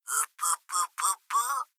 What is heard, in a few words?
upupupu; monokuma